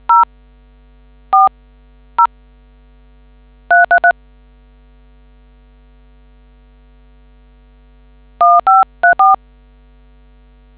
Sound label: dtmf